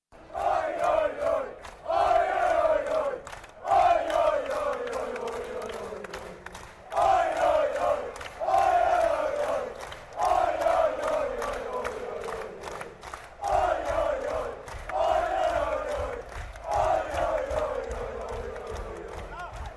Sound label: swedish football chant oi oi oi